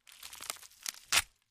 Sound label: Breaking Tearing; punches kicks hits bones